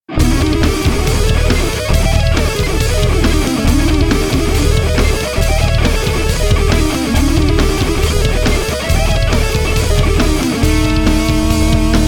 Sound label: locrian dominant